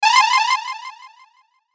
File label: Hit High Strings, Hit High Strings Delay; Blastwave